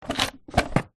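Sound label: household small wooden drawer open close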